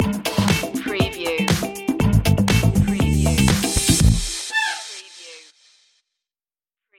salsa funk stinger

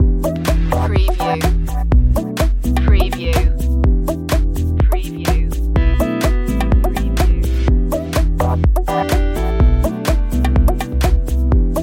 loop, chill

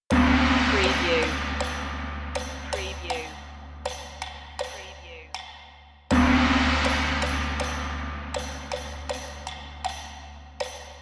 perc only

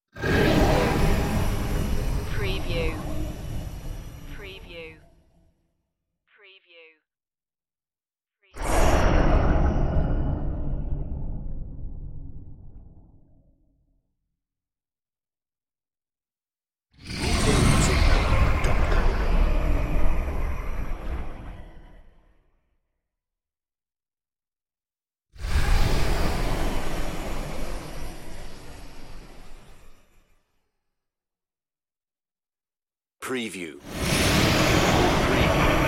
sudden spacecraft flybys

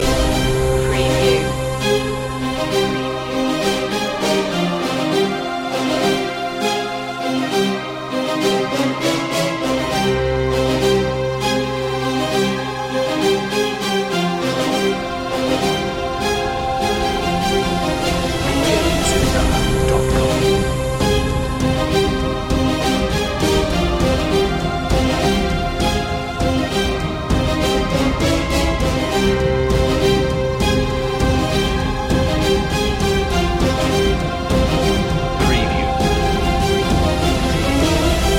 epic warfare loop

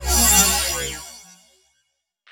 Whoosh Metallic Buzz; Sound Effects Bible

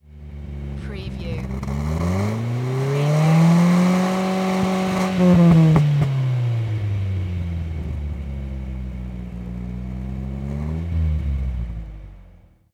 NISSAN SILVIA EXHAUST LONG REV

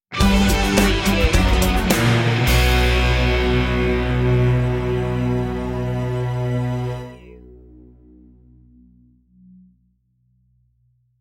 game time

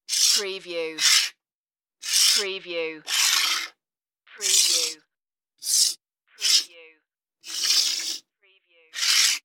slide coin over table

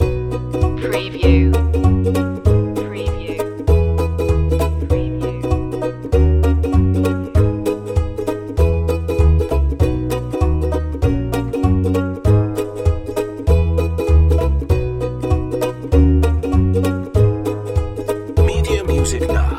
happy folk loop

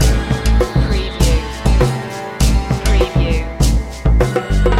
indianshop loop

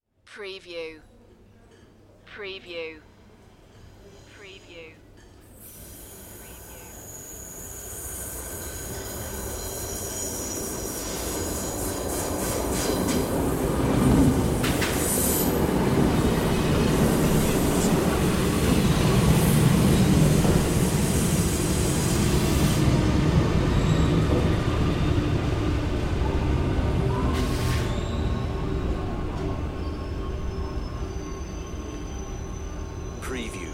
Metro Train Pull Up

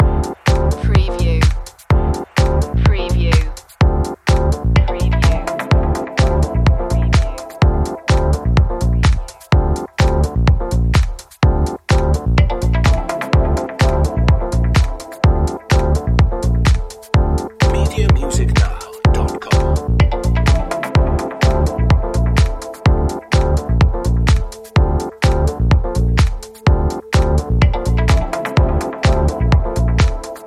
funkalectual loop

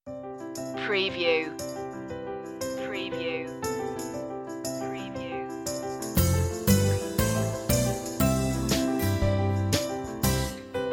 closer

